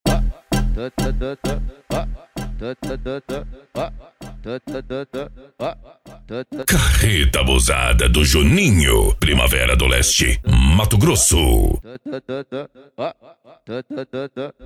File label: mega bass